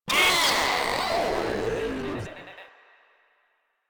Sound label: Death; Warframe; Proto Infested Runner